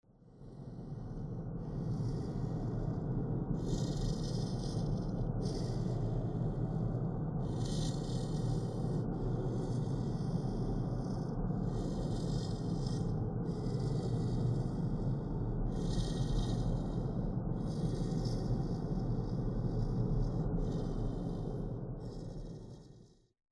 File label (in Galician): Buzo baixo auga